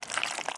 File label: Roll Dung Ball; Hollow Knight; Dung Defender Roll Dung Ball